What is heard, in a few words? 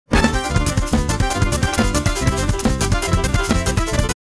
Jazz